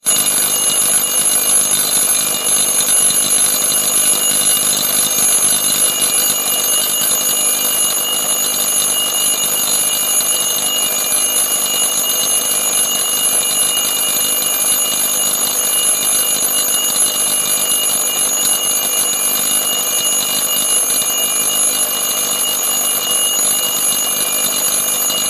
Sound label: Bell, reveil seiko rond cloches; rouge metallise; qhk063r